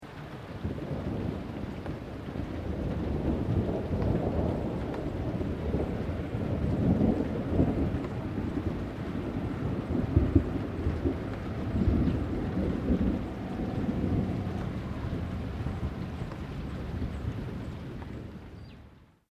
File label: THUNDER; Elemental weather effects; Thunder (Sound Effects:Weather)